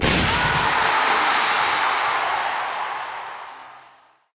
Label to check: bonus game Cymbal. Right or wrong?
Right